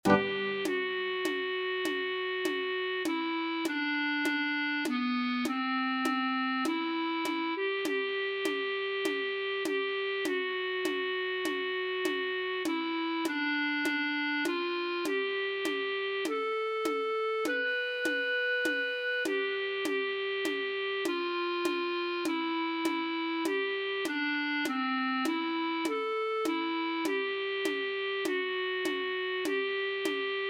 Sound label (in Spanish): clarinete